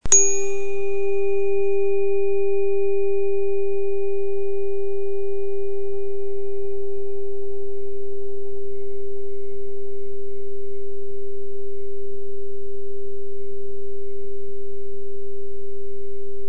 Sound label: 396Hz Analog